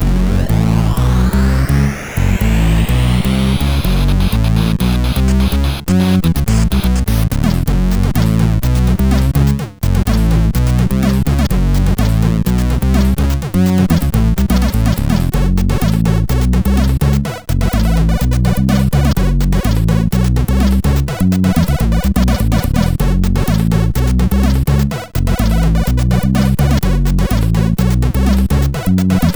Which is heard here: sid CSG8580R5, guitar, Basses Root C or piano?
sid CSG8580R5